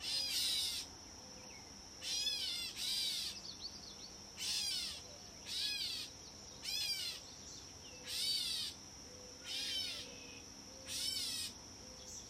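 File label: Gray Catbird call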